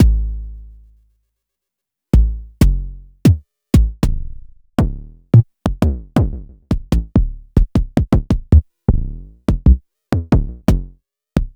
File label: Jomox Airbase991